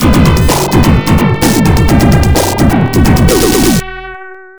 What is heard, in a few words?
Game Over Tune; Gods; Genesis